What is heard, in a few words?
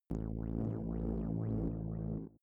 vibrating dizzy morning; pulsieren